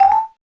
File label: Jump Top; msntv2